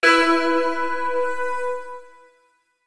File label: alarm victory; interface